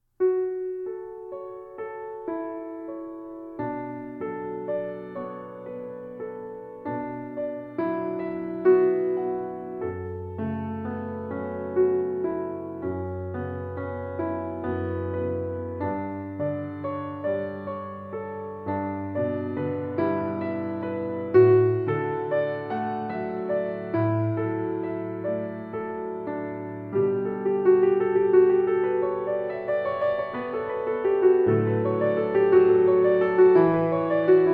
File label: Piano